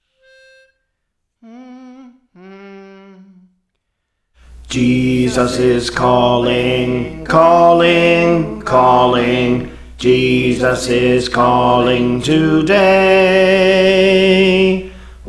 Bass; Praise For The Lord Training

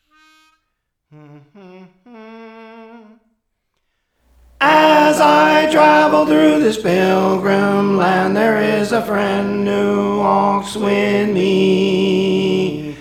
Tenor; Praise For The Lord Training

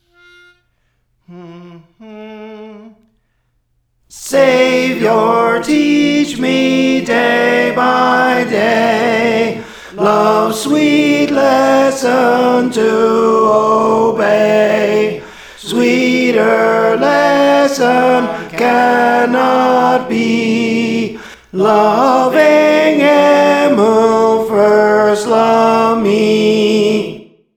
Tenor; Praise For The Lord Training

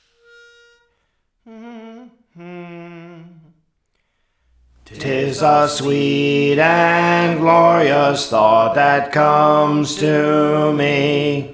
Alto; Praise For The Lord Training

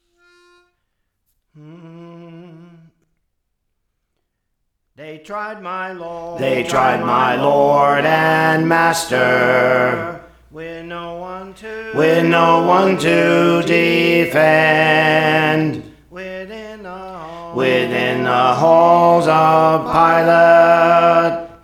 Alto; Praise For The Lord Training

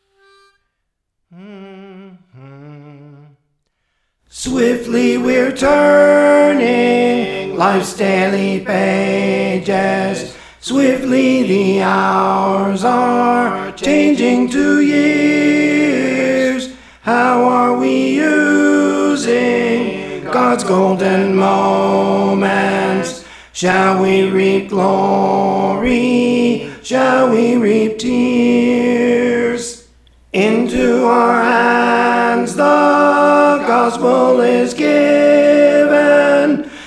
Tenor; Praise For The Lord Training

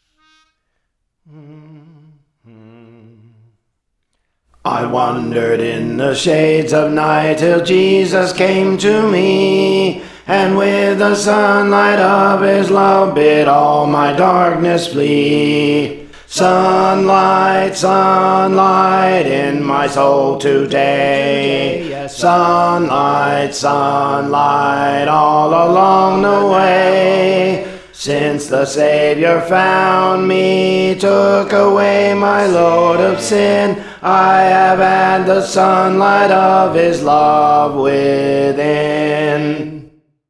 Alto; Praise For The Lord Training

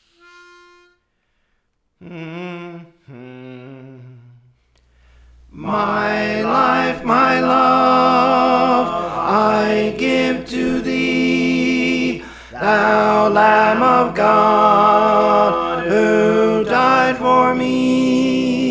Tenor; Praise For The Lord Training

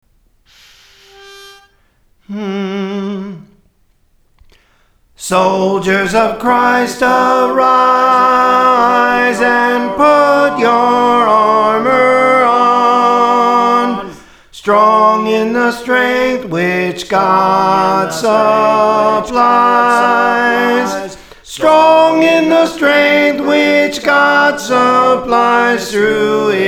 Soprano, Praise For The Lord Training

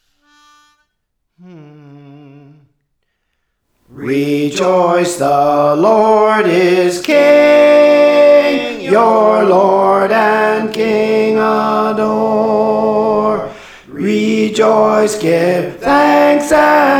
Tenor, Praise For The Lord Training

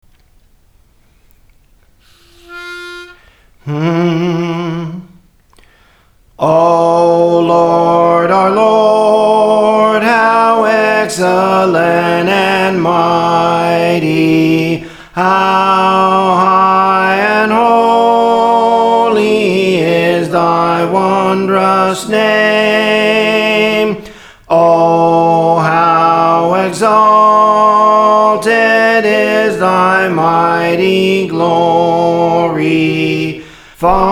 Soprano, Songs; Praise For The Lord Training

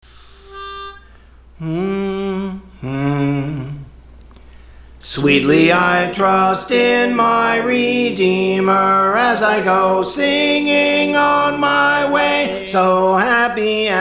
Soprano; Praise For The Lord Training

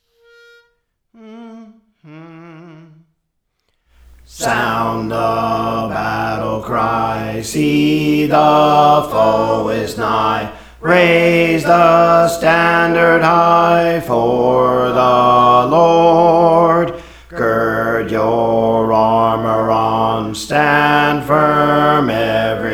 Bass; Praise For The Lord Training

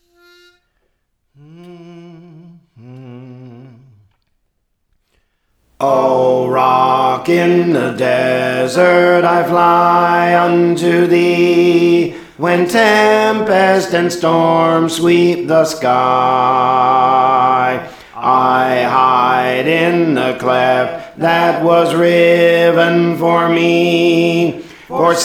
Alto, Praise For The Lord Training

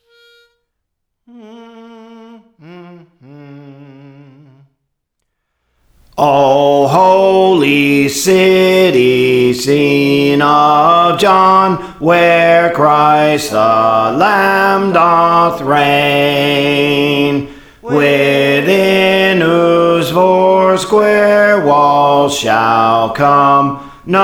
Bass, Songs; Praise For The Lord Training

